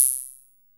NORD GUIRO; Drums In Your FACE!

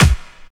combi drums

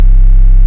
C1, BASSES

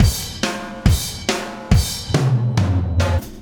Landing Party Beat, Processed Drumkits

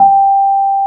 AMB ROAD; Keyboards Old School; USB Soundscan vol.09; AKAI

